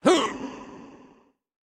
Effort Small; Hustlebonez Effort Small New

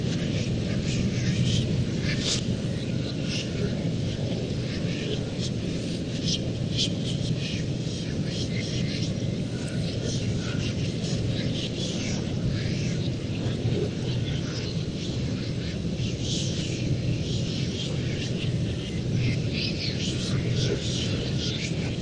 Offices Tar Ambient; SCU Offices - Tar Ambient 1

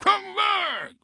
Guard Start Run Stumble Come Back; subway surfers city sound effects; Android, iOS

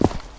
Step; Bath

